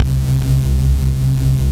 SAW FUNNELS, TECHNO; Pro-Rec - Synthsonic; Z1 TECHNO

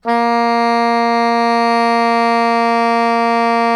SOPRANO A#2, Saxophones; MF; Greytsounds; Philip Wolfe David Crozier's Saxophones

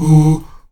AHOO D#3; Spectrasonics

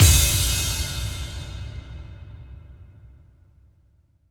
IMP DRM & CRSH, Cinematic Impact

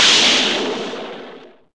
Noise; SNES; Brass Numbers

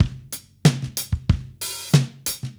Mixed Berry Jam Drums, 70s Analog Funk; Sonic Foundry (Sony Creative Software) - What it Is! 70s Analog Funk

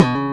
Pop Slide C#1; Proteus 2000; Digital Sound Factory Proteus 2000

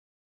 Harp; Heracles no Eikou IV - Kamigami kara no Okurimono

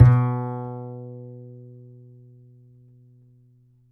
D string upright bass; Upright Acoustic Bass

